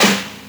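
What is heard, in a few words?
Fairlight IIx CMI snare 3; 300 Drum Machines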